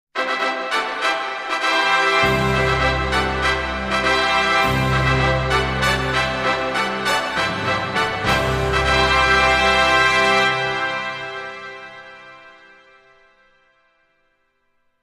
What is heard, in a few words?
fanfares